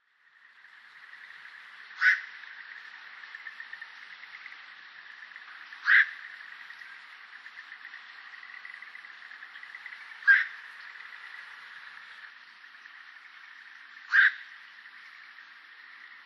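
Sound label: Black-crowned Night Heron; ゴイサギ; 栃木県渡良瀬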